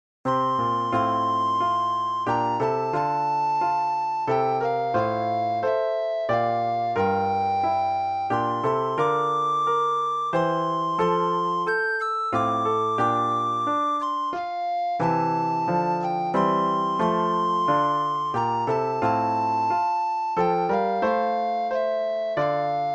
Away in a Manger - Recorder descant

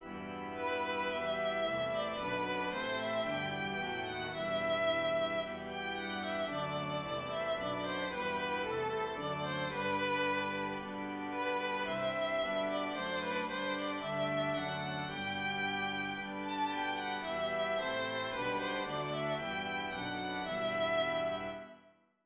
Lord Keep Us Steadfast Descant playback violin

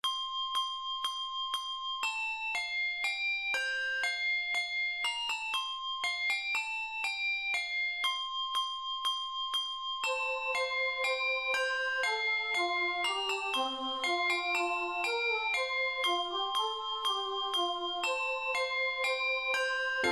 Choir HB Kybrd; Easter round for choir, handbells, and keyboard or other combination of voices and instrum

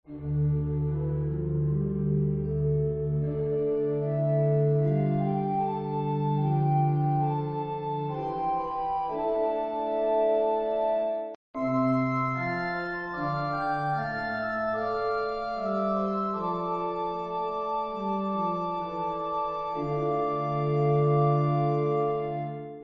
Organ; Psalm 51

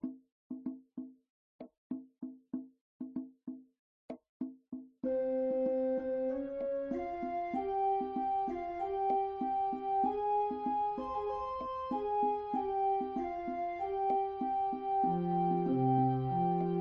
Organ congas; Meditation On Der Am Kreuz

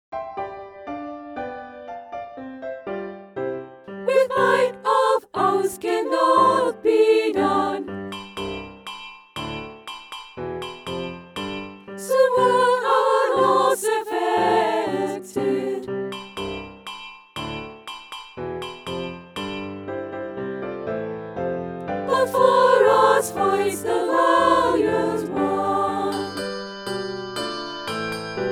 piano; A Mighty Fortress

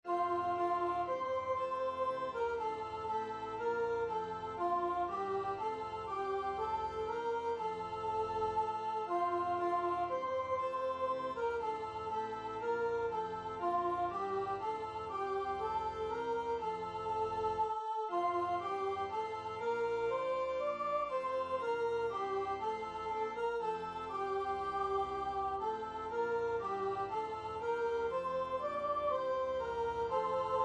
accompaniment descant; Praise to the Lord Almighty

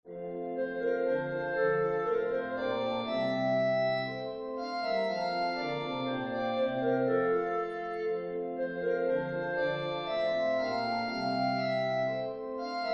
Christ The Lord Is Risen Today Clarinet playback

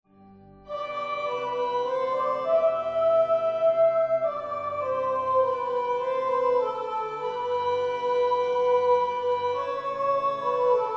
descant playback; For All The Saints

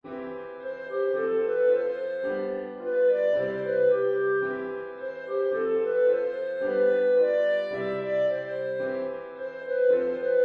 Clarinet descant; I Am Jesus Little Lamb

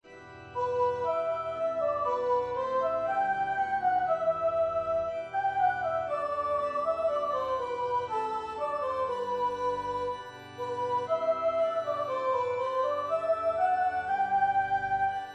Descant; Lord Keep Us Steadfast

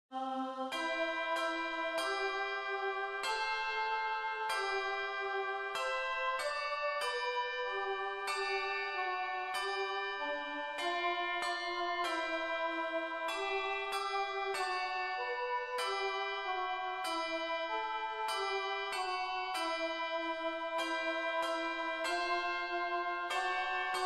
descant; Eternal Father Strong To Save; 2oct

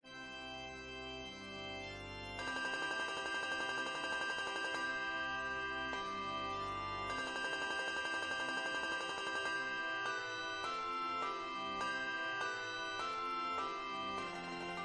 Intro playback; A Mighty Fortress

